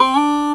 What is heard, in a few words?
talk box ooh